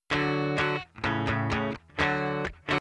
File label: Guitar Sms